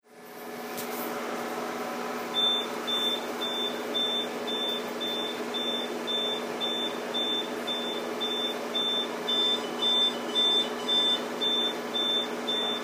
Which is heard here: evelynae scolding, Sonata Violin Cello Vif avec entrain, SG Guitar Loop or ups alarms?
ups alarms